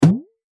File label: jump